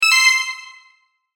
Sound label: correct; BSのクイズ番組で使われそうなピンポン。高音ver。; 正解の音・ピンポーン